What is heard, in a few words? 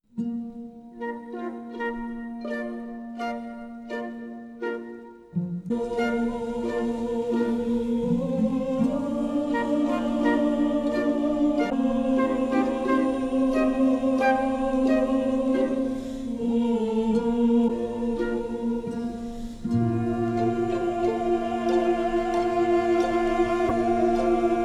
Humming Chorus; Madam Butterfly